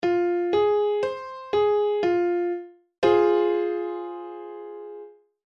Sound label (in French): degré IV m